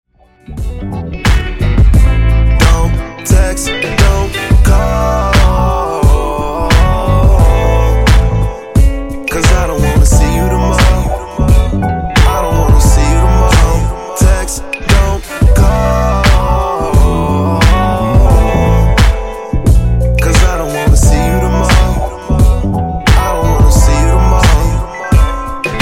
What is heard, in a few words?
88Bpm; Dj Intro Outro